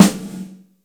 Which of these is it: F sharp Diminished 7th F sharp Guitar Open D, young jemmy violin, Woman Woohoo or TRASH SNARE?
TRASH SNARE